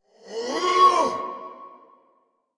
Attack Effort; Warframe; Dax Ashigaru; en

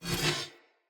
Weapon Common Accent; Weapons; Player; Swords; Mockery

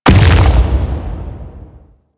Rock Elemental Land; Warframe; Brawler

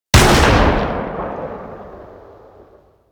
Laser Aim Rifle Shot, Weapons; Grineer

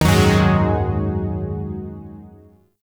Shawzin Yo Chord E; Zariman Shawzin

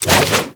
Flip Whoosh; Enemies; Foley; Warframe; Teshin; DE

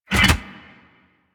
Gas City Mechanism Lock; Ambience; Props; Warframe; Corpus Gas City; Props Remaster

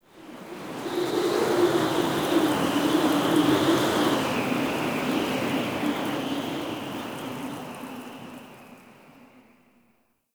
Under City Wind Gust Strong Whistle Rope

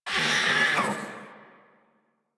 Catbrow Combat Vocal Death; Warframe

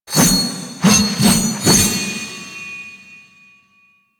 Sword Finisher; Warframe; Quests; The New War; Teshin

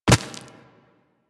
Walk Rock, Footsteps; Warframe; Operator

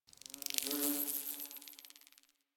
Eidolon Insect Day; Ambience; Landscape; Warframe; Eidolon Plains; One Offs; Insects

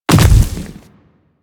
Splitter Land Default, Footsteps; Warframe; Infested; Grey Strain

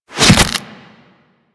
Desert Skate Barb Hit Default E; Warframe; Attacks; Hit Effects

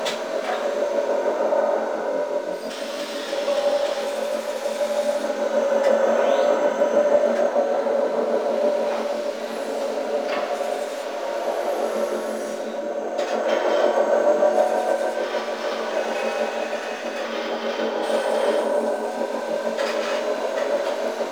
Rail Jack Launch Room Ambience; Warframe

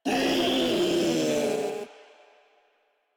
Infested Ancient Vocal Attack; Enemies; Proto Infested Ancient; Warframe; Ancient Ninety Nine

